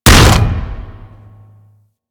Hammer Impact Flesh D; Warframe; Weapons

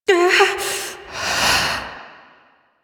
Breath; Fight Lotus; Warframe; The New War; Throne Room

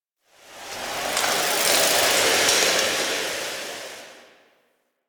Tower Rustling Branch; Warframe; Quests; TheNewWar; Ambience; Cetus; Unum Tower

